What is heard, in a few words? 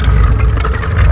stndr; Quake; doors